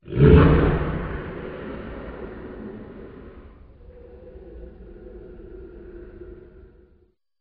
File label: roar of beast; animal